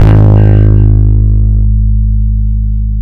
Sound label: FUZZ G#2, bass; Spectrasonics; Bass Legends